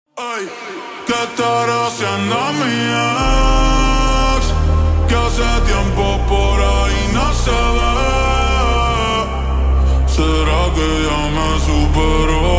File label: Slow Reverb Version Ringtone